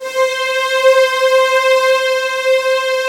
ANLG STR, ANALOG STR

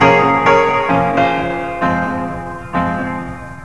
PIANO FX; USB Soundscan vol.27

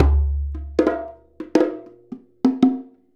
Djembe and Bongos; Combo

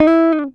GTR TRIK, Jazzistic; Univers Sons

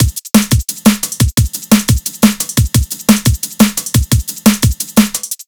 DRMLP, Drum Loops; neuro; Stanza

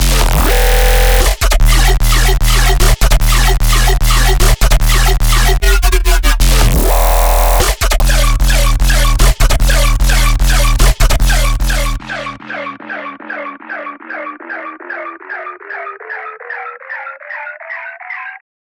bass loop, 150, E; HealthyLunchDemoTrack; Virtual Riot

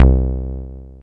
SOFT C2; Best Service Alpha Dance vol.2; SOFT SEQ.2

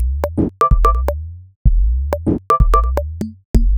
Top B 127bpm, House Drumloops; Zero-G House Fabrik Drumloops; ZG Drumloops

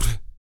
TEETH CLEAN, Vocal; Spectrasonics; Vocal Planet; Blues Soul

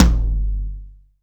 TOM, Drums; Best Service; Real Mega Drums VOL-1

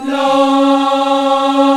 VOX, African Aahs, African Las

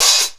Hats and cyms, Funky Drums, Kit hits

